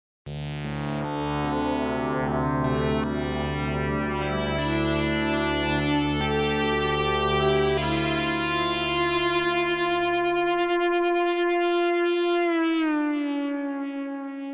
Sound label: Kaox FM Analog Solo